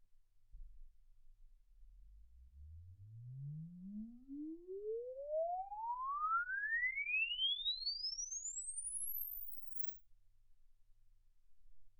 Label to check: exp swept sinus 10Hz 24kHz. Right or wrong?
Right